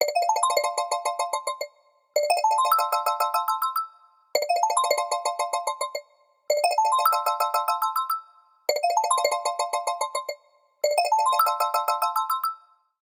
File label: ringer stutter